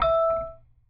Bell E3

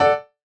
buildup

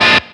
A#7 staccato; osu

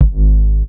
Bass Ab with kick

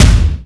Kick Crushing Bump

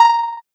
soft hit whistle

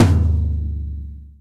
LTom

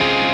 4 6 5 6 4 Dbmaj7

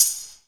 chime

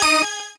normal hit normal

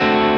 x 6 4 6 4 C#7

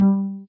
soft hitwhistle; osu

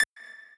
lead

